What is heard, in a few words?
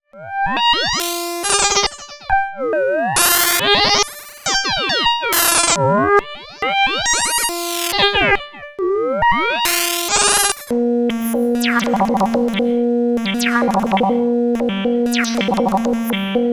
Buchla und Tiptop Audio 245t Doepfer A-111-5 Two Glitchy Sequenzen